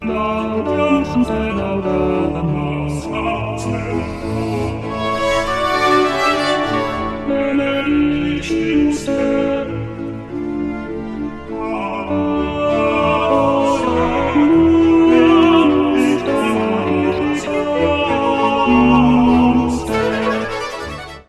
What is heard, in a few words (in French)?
Gloria tenor; Stage Zelenka 2023; zelenka zwv21